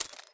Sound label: Camera Broken Shutter